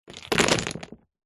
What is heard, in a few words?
leisure game jenga wood blocks tip out